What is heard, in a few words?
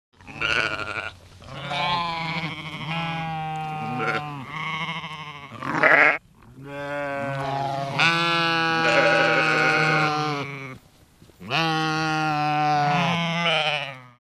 Flock of sheep bleating